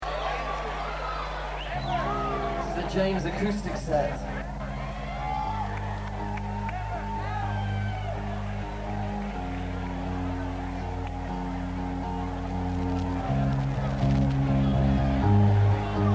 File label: Ring The Bells